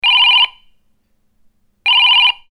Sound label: european phone